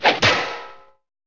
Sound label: Exclamation; KRONDOR